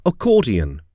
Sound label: accordion